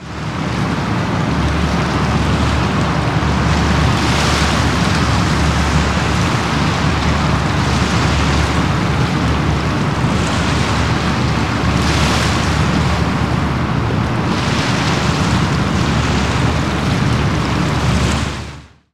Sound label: boat onboard barge; best service Blue Box; sea traffic